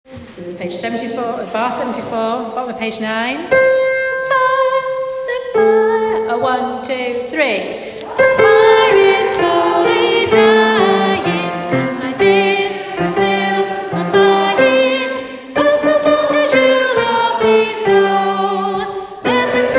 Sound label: bass